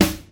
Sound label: juicy snare drum D# key, Royality free steel snare drum sample tuned to the D# note.; snare drum samples; one shots